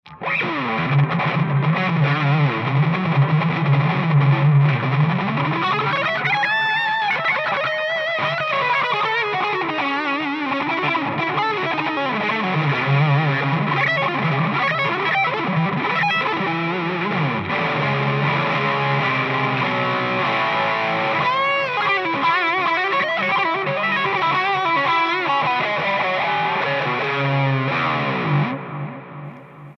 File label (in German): shredden mit verzerrung; Gitarreneinsteiger